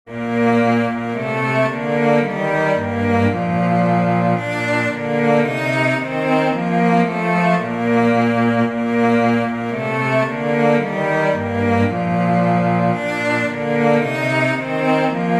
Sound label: Come Ye Children of the Lord cello duet